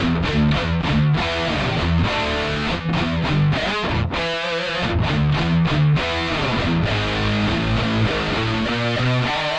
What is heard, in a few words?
100 powermetal chorder; GUITAR LOOPS